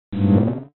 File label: button